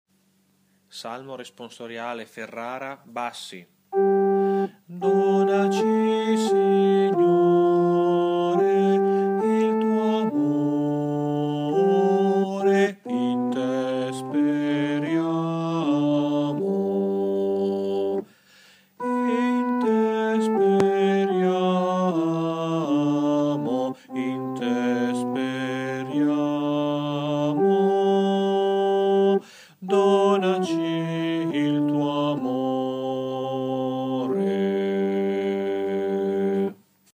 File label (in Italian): BASSI